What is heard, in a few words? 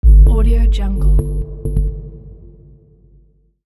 insert dubstep